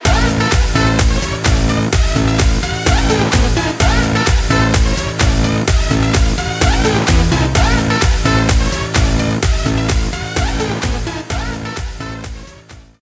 Stinger; DFH Stadium

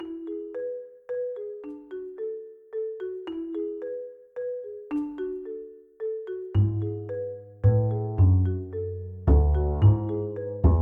Playful Chat; Steamworld Quest Hand of Gilgamech; nintendo switch

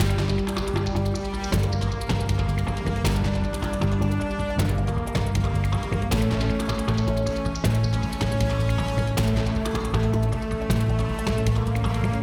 Tornado Platforming MIXED; Ben 10; switch gamerip

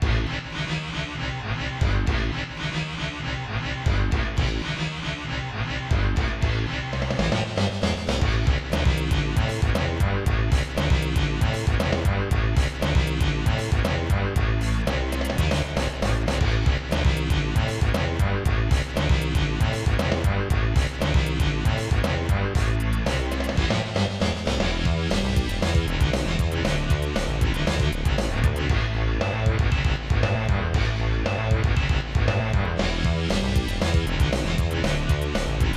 Gongs; Super Fire Pro Wrestling X; SNES

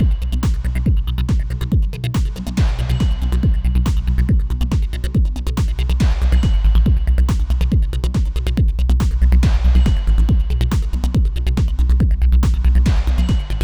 Bass Beat better loop; Bust n Rush

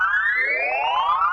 Sparkle Rise Loop

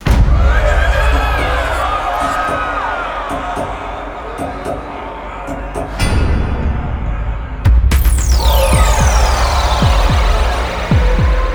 Music Disco DJ; Plants vs. Zombies Battle for Neighborville

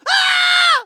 Raptor scream

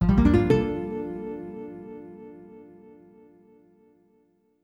Jingle Caught Fish Small; AR Games

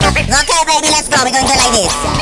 Battle Mode Stage Start

